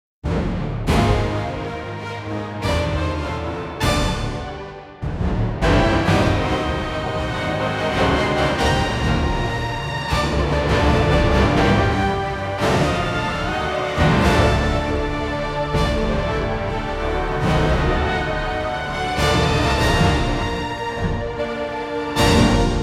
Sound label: dramatic combat orchestral music